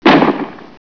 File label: SHOT; Simon and Schuster Interactive